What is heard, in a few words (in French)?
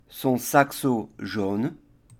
son saxo jaune